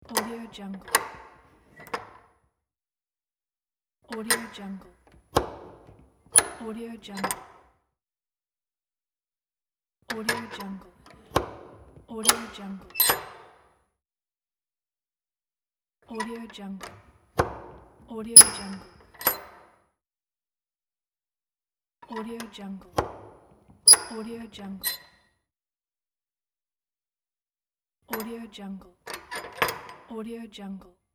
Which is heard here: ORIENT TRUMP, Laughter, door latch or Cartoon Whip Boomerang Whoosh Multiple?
door latch